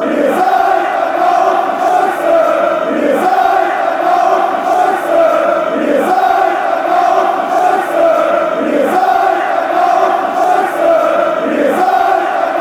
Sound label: piece fanchants